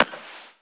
Perc, Dubstep One-Shot Drums

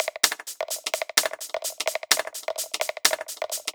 Loop, 128 BPM

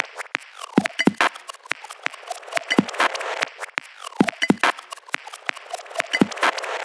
Bpm140 Perc Loop, Dubstep; Upsidedown

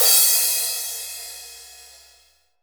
Crash, Cymbals

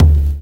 Perks; Drum Hits; Loopmasters; Jon Carter & Alex Blanco; Block Party Essentials

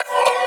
B STAB, funky

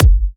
Bassdrum

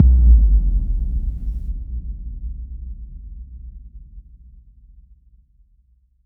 FX Impact

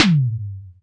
Toms

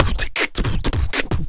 Human Beat Box, Drum & Bass; Loopmasters

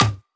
Percussive; Percussion; Mutekki Media; Vengeance Electroshock Vol.2

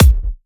NuTrance Kit Bassdrum; Mutekki Media; Vengeance Electroshock Vol.2

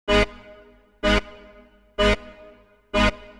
126 G# music, Funky Underground; Loopmasters; Funky Underground Vol 2

